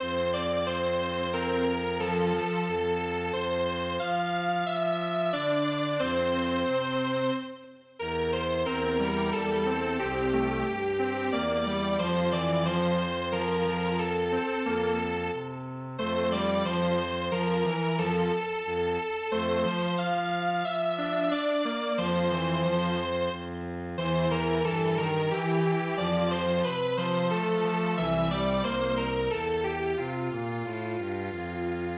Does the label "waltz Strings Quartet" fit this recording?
Yes